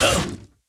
Recoil; Puzzle Chronicles; PS3; PSP; X360; SFX 3D Diraqiknight

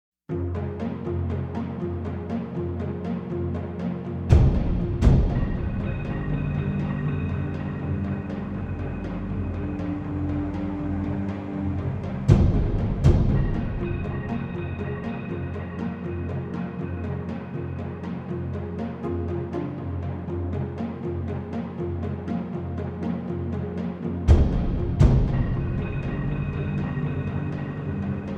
Fortress Ambience; Myst; Fortress Ambience Part II

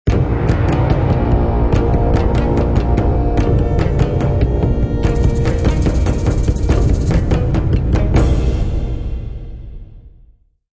Ancient Combat; Civilization Revolution; Xbox

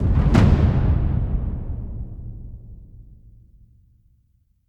Death Drum

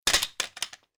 drop Shotgun

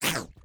Monster Growl

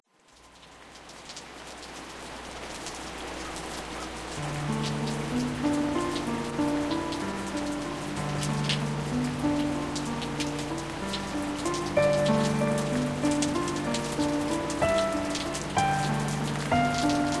Ambient Rain; Battle Mages

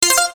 Ding

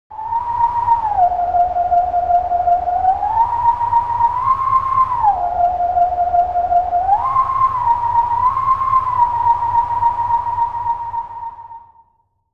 Wolf Song (Howling Stone)

